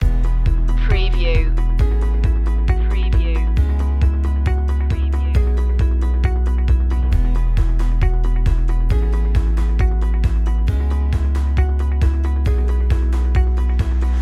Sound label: upbeat impact loop